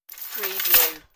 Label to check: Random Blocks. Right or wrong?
Wrong